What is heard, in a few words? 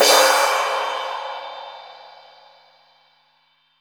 crash L; Zero-G; Chemical Beats; CRASH ZOO